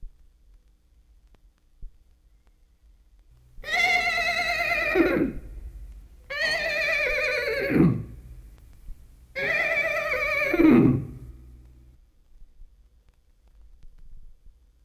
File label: Horse Whinnies; Jac Holzman; Authentic Sound Effects Volume 2